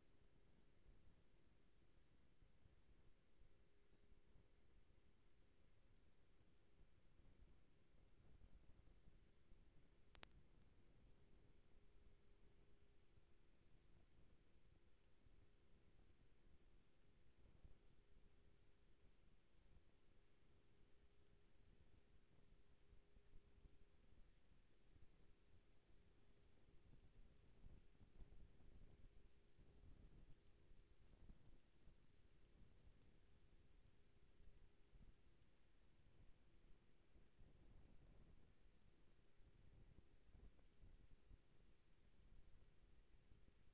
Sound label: HYDROPHONE 16000Hz; JASCO AMAR HYDROPHONE 740